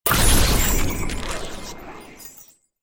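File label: sounds of magic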